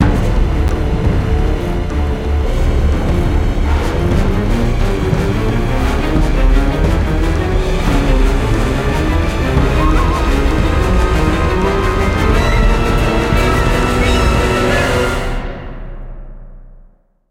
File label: Terror Sting; Jurassic Park The Game